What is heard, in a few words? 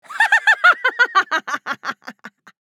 Crazy female evil laugh